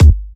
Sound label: Kicks Electro